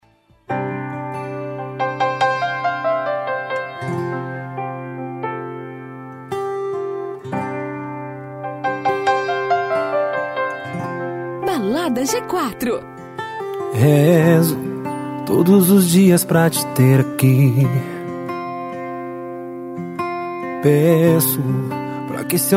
arrocha, sertanejo

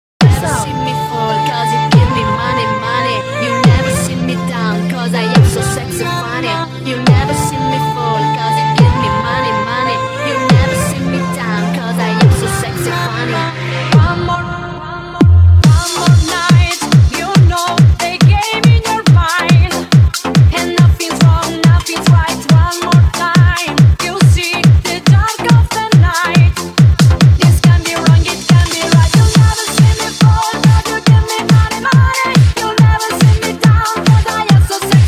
eletrofunk pancadao automotivo

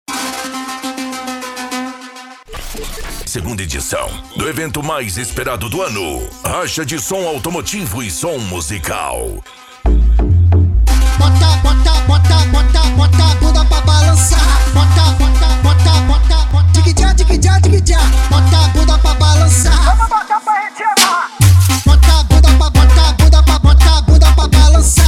racha de som automotivo e som musical

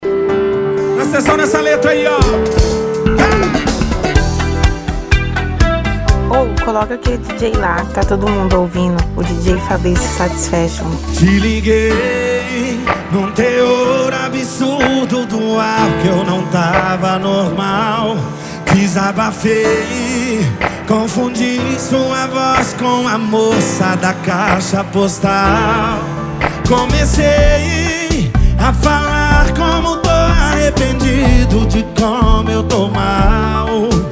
sertanejo

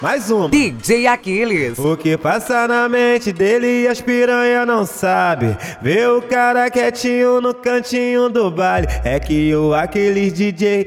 hip hop mega funk

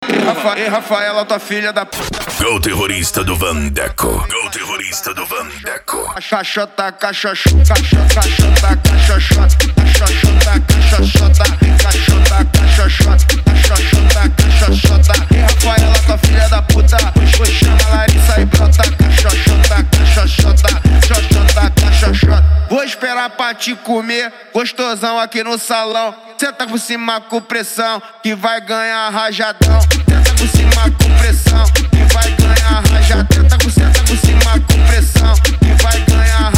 dance e funk mega bass

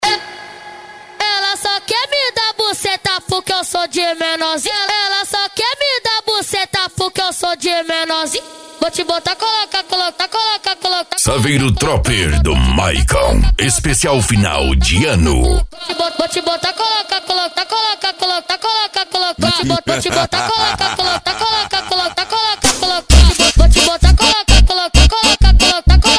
funk agressivo funk

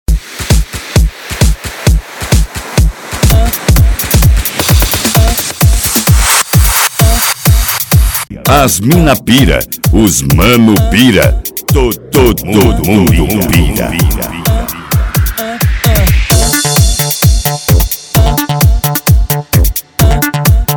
eletro funk pancadao

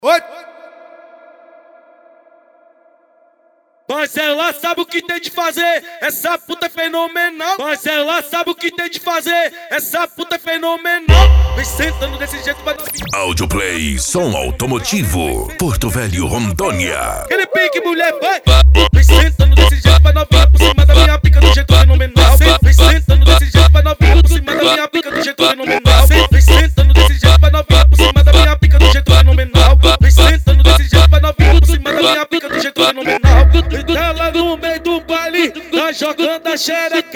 esp funk mega bass

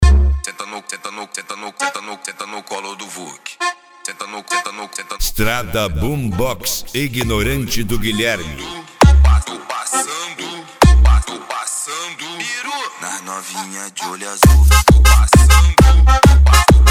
esp funk atack

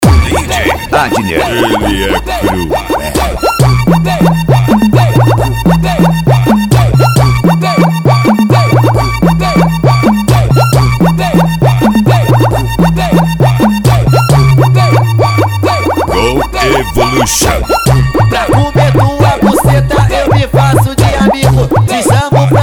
grave mg funk tumdumdum funk bass

